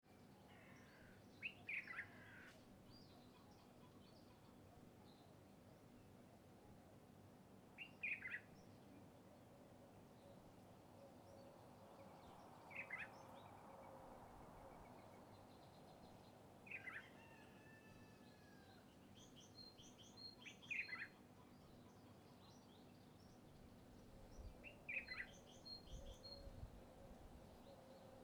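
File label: country birds crows flies rooster; atmosphere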